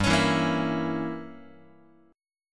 G Diminished 7th Guitar, Gdim7 chord; strummed; JGuitar

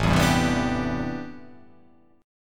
A Minor 11th A, Chord

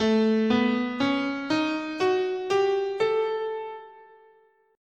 A Prometheus, scale

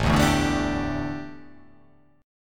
A 7th Suspended 4th, A, down

